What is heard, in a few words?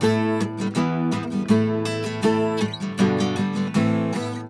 AM GTR, Celtic Flavours; USB Soundscan vol.42; AKAI